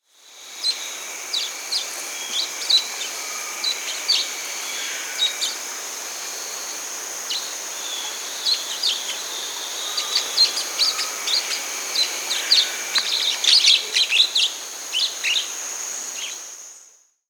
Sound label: Amazonian Parrotlet (Nannopsittaca d; S. America